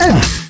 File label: fall; openffa; terminator